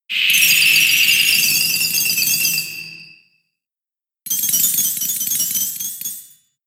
Hail; pokemon sfx gen 7 attack moves sumo usum

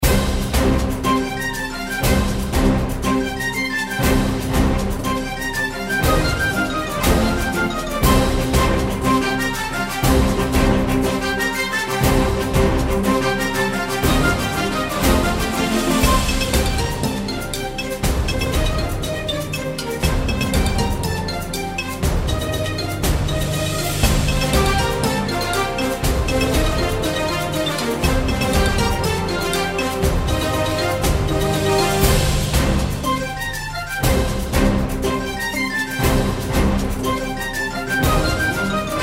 Music Game; Strikeforce Kitty

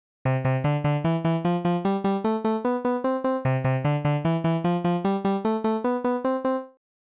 Speaker Check

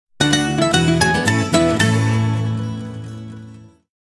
Level Start; Alex Kidd in Miracle World DX

